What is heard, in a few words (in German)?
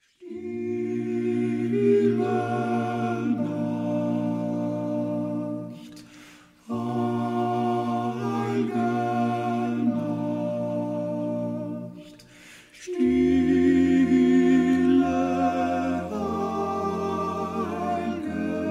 Weihnachtsglocken